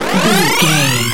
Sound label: retro boost power